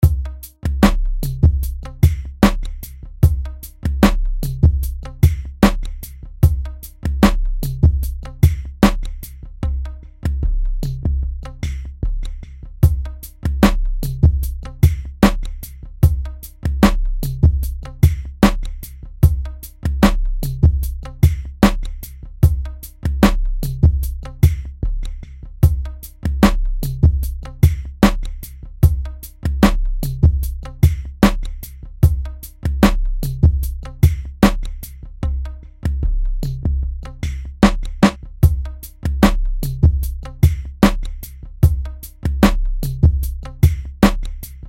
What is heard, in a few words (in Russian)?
Минус Beat Hip Hop Rap SuBass